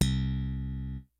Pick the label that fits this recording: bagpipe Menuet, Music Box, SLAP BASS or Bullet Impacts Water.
SLAP BASS